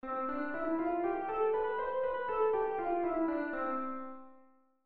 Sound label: C major scale